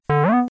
phaser Up; kenney digitalaudio

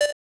beep, 8 bit sound effects

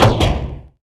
Door Closed; FREE SFX PACK VOL 3

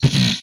Impact, retro arcade; MMRetroArcadeSoundsPack

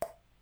pop; abstract; Free Sound Assets

